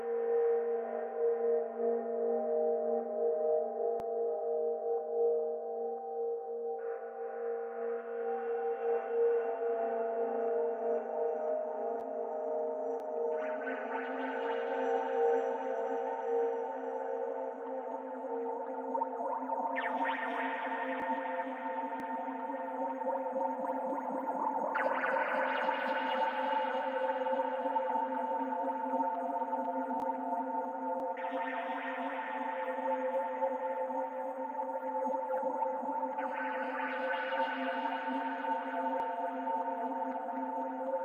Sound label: Space Sounds Soundscape